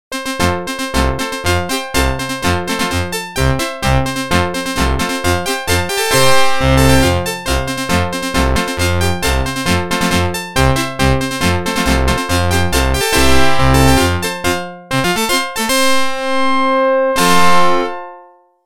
Organ; NHL 94

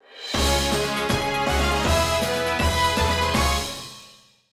Jingle Mission Cleared!; StreetPass Mii Plaza; StreetPass Squad

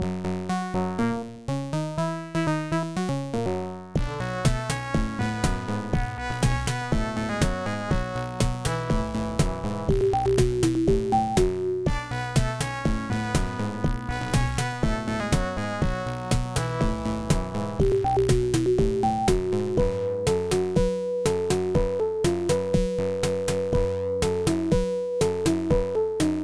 Level BGM; Dyna Blaster; ibm pc at

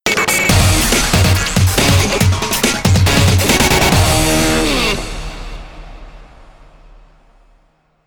victory jingle

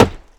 Axe wood; In the Line of Duty Firefighter

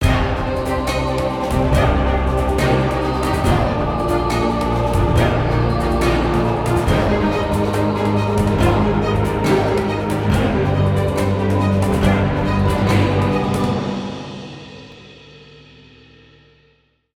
Battle Drums; Necropolis

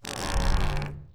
Swing Creak; adams venture origins

